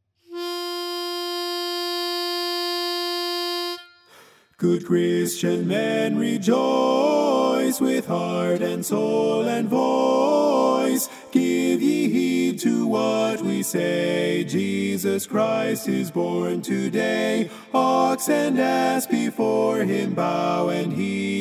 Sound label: LEAD